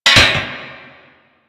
Metal Pipe Drop; Ambience; Props; Warframe; NineteenNinetyNine

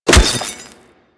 Melee Staff Impact Robotic Flesh

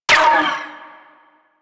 Uzi Layer; Warframe; Tenno

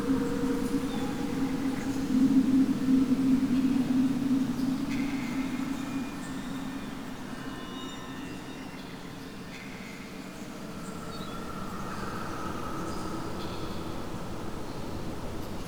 Cave Sprout Amb Loop; Midrath Island

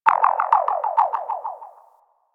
Tremor Sense Pulse; Warframe; Catbrow; Mods

